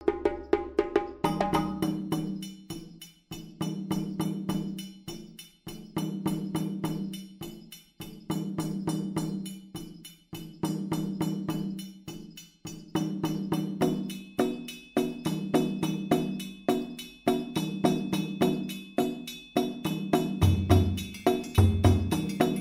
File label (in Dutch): Moribayassa Opbouw, djembe